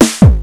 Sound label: beat, party